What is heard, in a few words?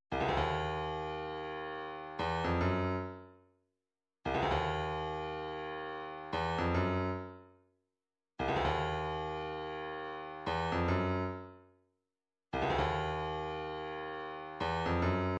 116 blip